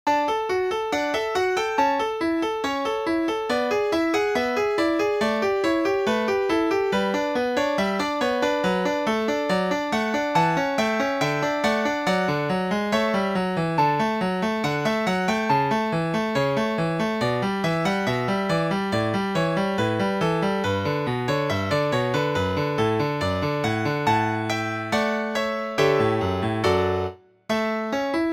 Rondo

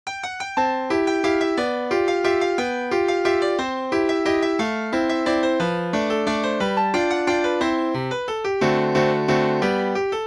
Valse Impromptue, Klavier

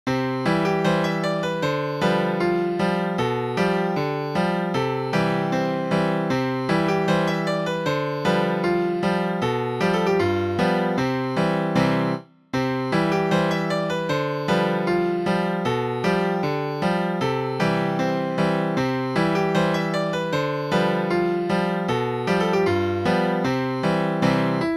Swedish Polka, piano

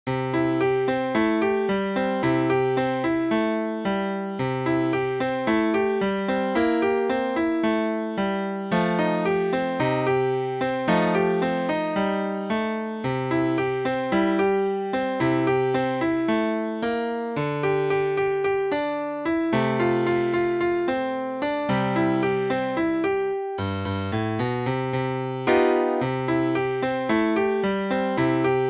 Blues

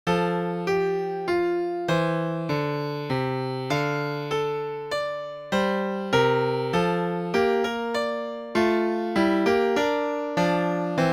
Duettino

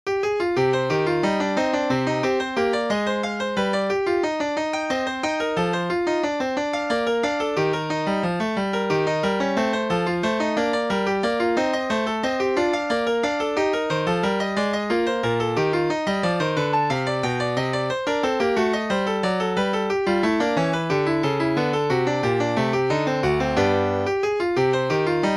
Courante

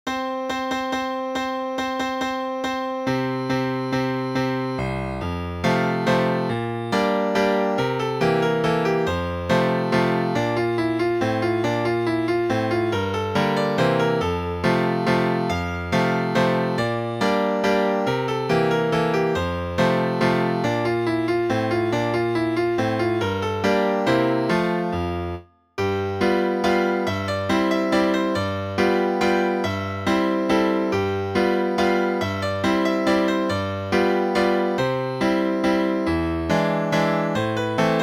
Country Dance

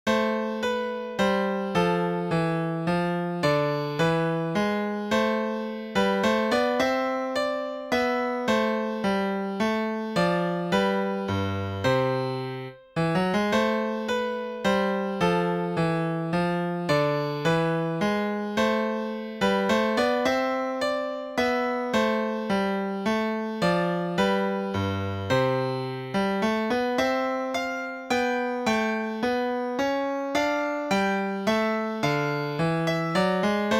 Air

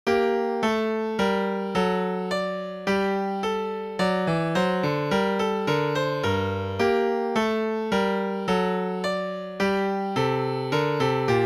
Minuet

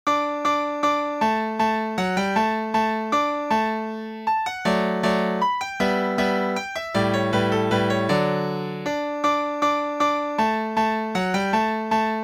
German Dance

